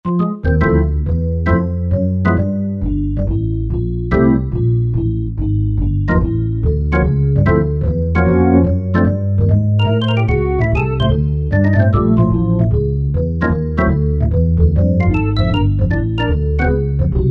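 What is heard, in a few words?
Jazz Organ 1; Yamaha DJX; Voices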